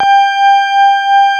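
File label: CX3 organ G1 L, Keyboards Old School; USB Soundscan vol.09; AKAI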